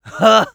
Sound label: laugh short